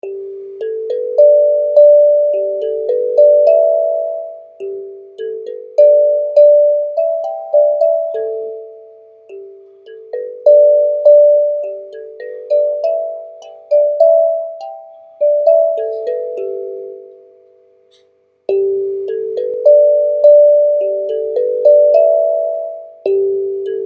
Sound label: Poorly Recorded Steel Tongue Drum Lullaby